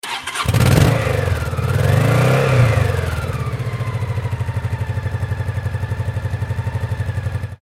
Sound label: transportation motorcycle start rev idle honda pacific coast 800cc; Motorcycle Start, Rev Then Idle; Vehicles